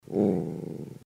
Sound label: growl